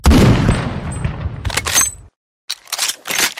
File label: 50 shooting and reloading; bfg